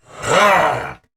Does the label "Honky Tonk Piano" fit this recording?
No